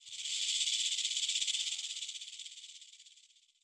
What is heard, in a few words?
Naga Ice Snake